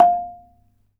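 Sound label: ranat thum F5; Spectrasonics - Heart of Asia CD2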